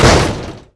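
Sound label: impact vehicle hard; Cars; XBOX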